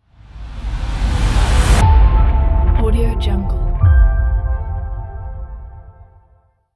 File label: logo